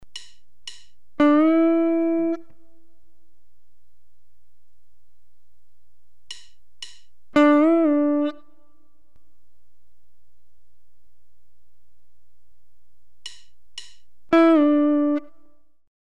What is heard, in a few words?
Bends and releases, guitar